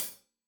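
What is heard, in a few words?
hat closed, hi-hat cymbals stereo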